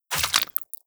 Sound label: debris flesh, bullet impact debris